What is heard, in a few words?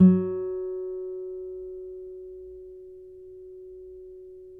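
nylon, FLAMENCO, Guitars; Spectrasonics; Hans Zimmer Guitars VOL-2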